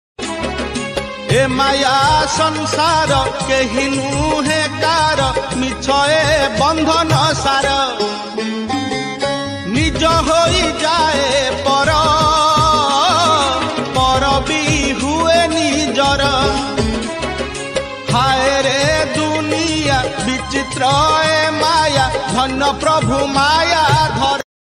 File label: bhajan ringtone; shree jagannath